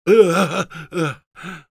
pain severe, es; Engineer

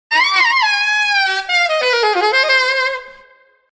solo; Killer

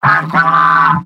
Pyro cheers

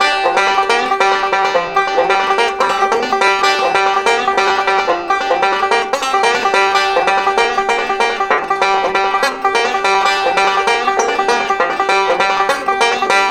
banjo music; Taunt; bumpkins

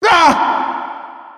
headbomb hit

